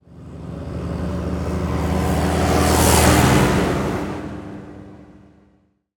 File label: tractor drive by fast on highway; transportation